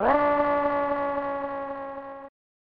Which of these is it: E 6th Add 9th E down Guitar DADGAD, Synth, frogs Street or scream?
scream